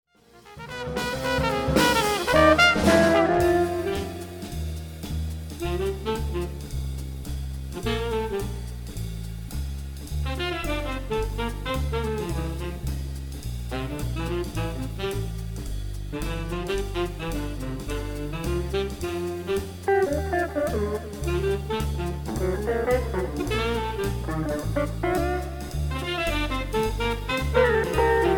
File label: jazz; composizioni originali e arrangiamenti